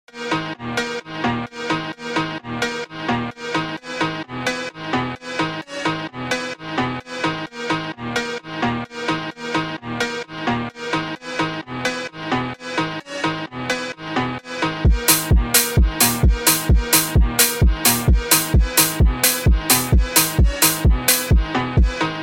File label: Phonk Remix; call Of Duty Zombies